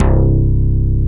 SMA, synthesizer bass; VFX-SD

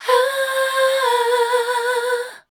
MEL C2 L, Voice Spectral, MELODIC; Best Service; Voice Spectral II

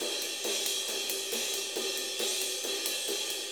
4-4 Ride Loop; Drum Tools; 4-4 Drum Loops; HiHat & Ride Loops

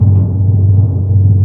Orchestral Perc, Timp FX; Roland LCDP03; Ambo TimpRol

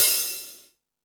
Fusion Stix, Drum Machines

